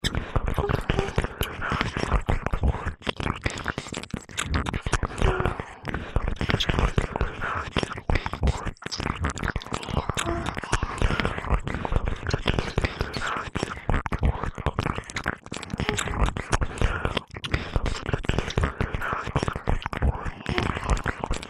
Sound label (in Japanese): キス ＋ 高速れろれろ両耳舐め 通常耳舐め